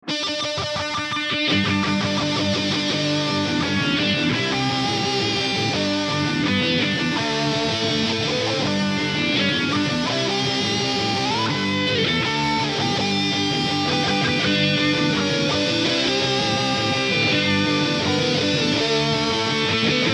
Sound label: guitar hail; redskins